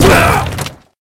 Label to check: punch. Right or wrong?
Right